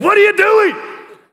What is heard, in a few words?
Vocal Phrases; Vengeance Samples; Vocals